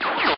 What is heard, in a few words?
bullet whiz